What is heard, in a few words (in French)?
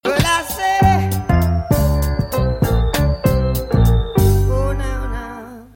sonnerie